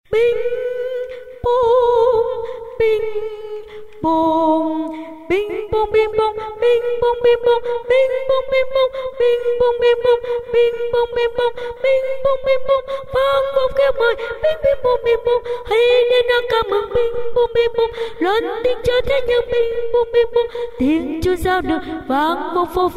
tenor

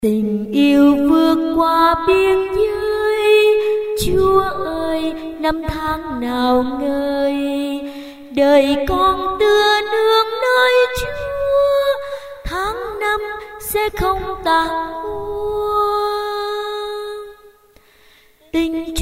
alto

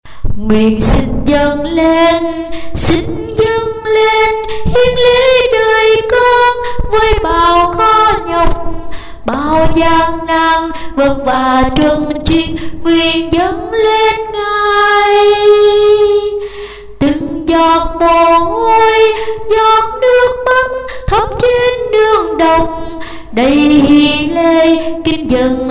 sop alto

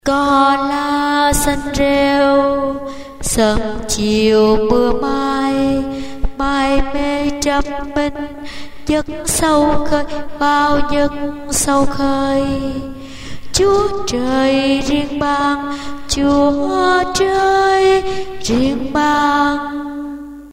basso

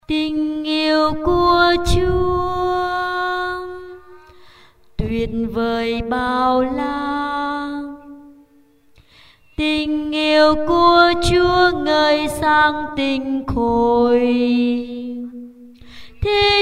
alto basso

